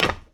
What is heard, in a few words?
steel land; Golden Crayon Sounds